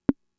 Conga, Fricke MFB-522

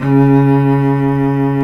CELLO C#3 R, SOLO STRINGS; Greytsounds VOL-1

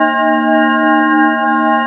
A#4, TURBOBELLS; Northstar - The Wizard VOL-1

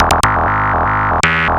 Analoop, Industrial

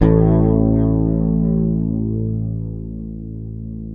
Fingered bass A1 L; Sampleheads; Will Lee Bass Library

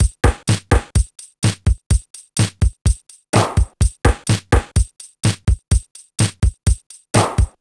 Linn Beat, Drumloops; Sonic Foundry (Sony Creative Software); Dr. Fink's Funk Factory

